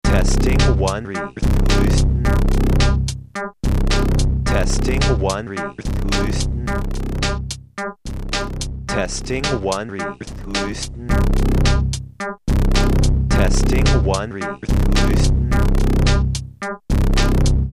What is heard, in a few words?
accent n vol auto n fm kick